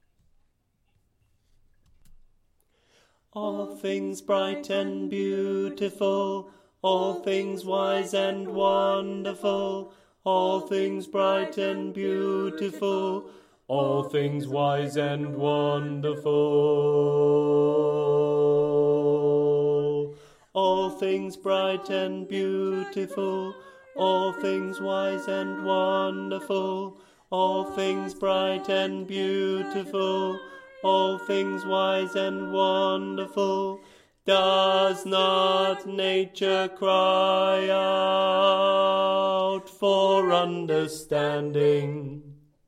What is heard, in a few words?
BASS